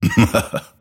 grimstroke laugh; dota

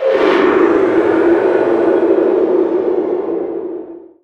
item cast; crozier; dota

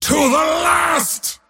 attack pain; dota; mars

